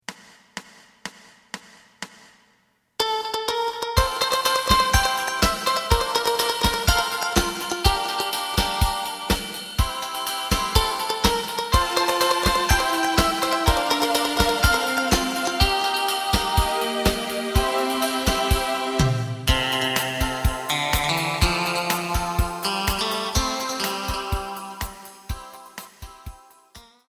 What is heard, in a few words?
bass